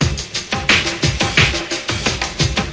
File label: 087 reverb percusso claps, drum loops